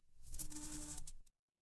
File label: animal insect